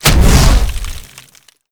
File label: Waterball Hit